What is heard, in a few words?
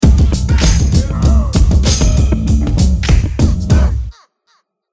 emote music; fortnite emotes